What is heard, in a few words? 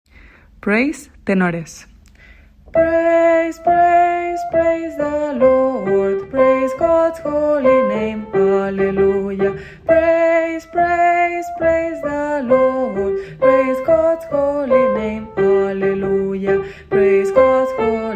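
praise tenor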